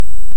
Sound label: Hydrophone; OneOcean